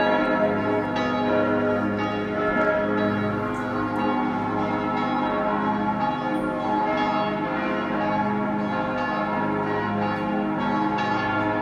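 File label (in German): Glockengeläut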